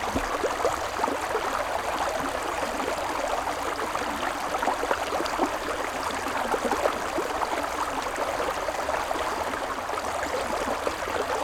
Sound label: water river; ott3d